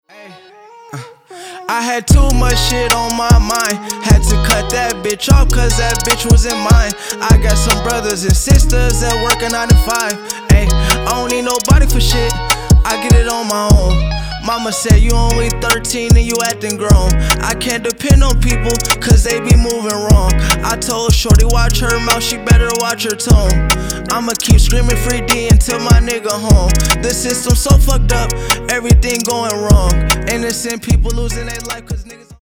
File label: HIP HOP II